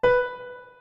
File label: piano note